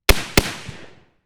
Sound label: FN FAL 7.62x51mm behind right shots; 1.5m